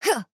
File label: Attack Small